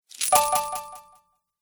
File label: Loot Bonus, video game